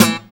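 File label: GUI PULL, acoustic guitar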